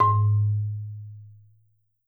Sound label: marimba soft G#1, Marimba